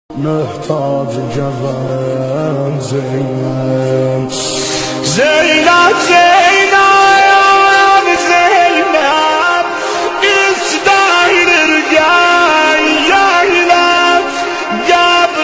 noha ringtone irani ringtone farsi ringtone sad irani ringtone